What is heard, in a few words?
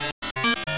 think, ambience